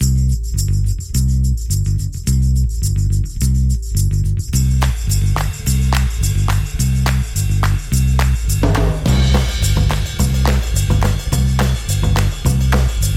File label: Bossa Nova